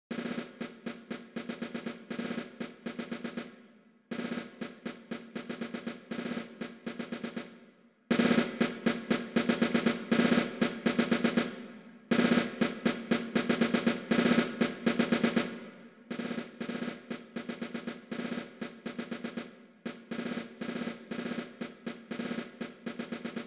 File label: Side Drum; Grenadiers; london band drill competition